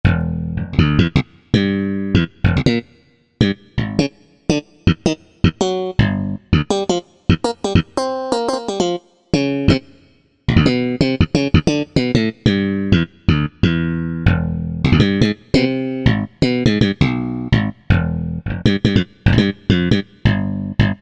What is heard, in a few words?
Slap Bass, Korg M1; Factory preset examples